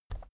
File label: keyboard external key push soft